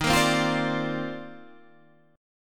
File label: C Minor 11th D sharp